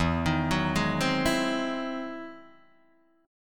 E Augmented Major 9th, Guitar, Open D

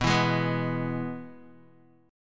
G sharp Minor Sharp 5th

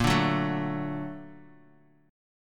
Bb Augmented Major 7th Bb down

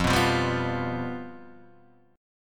F Suspended 2nd Flat 5th, F; Fsus2b5 strummed; 1,2,3,0,0,1

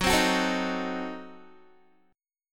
F sharp Diminished 7th F sharp Guitar; F#dim7 strummed; 14,15,16,14,15,x

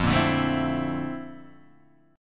Bb Major Sharp 11th F, chord

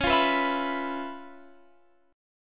C sharp 7th Sharp 9th C sharp Guitar Open F; strummed

